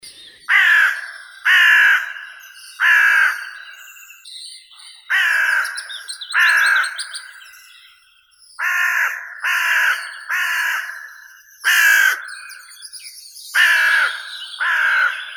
vorona chernaya evropeiskaya corvus corone, zvuki prirodi

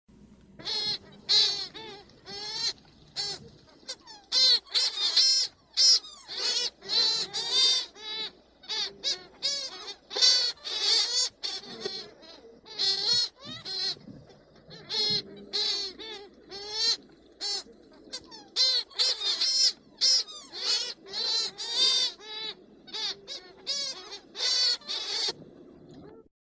burevestnik bolshoi pestrobruhii puffinus gravis

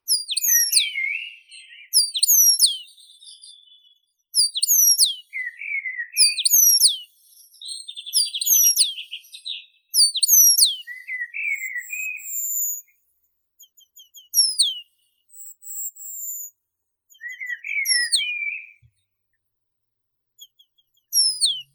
remez obiknovennii remiz pendulinus; zvuki prirodi

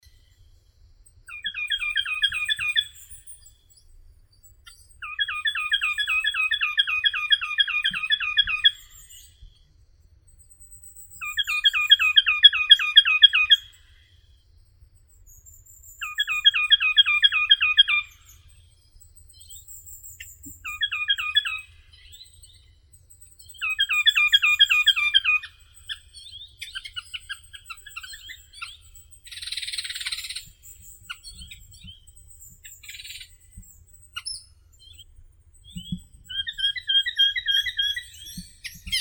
popolzen chernogolovii sitta krueperi, zvuki prirodi

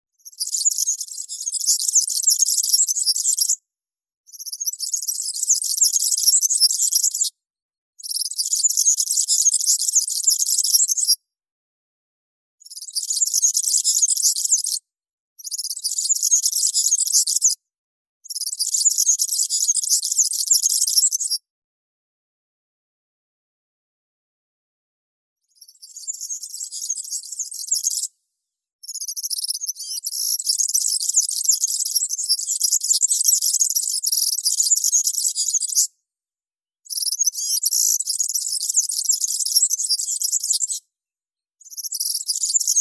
vurok evropeiskii serinus serinus, zvuki prirodi

